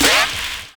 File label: RAIN BEAT; Univers Sons - Kitch 70s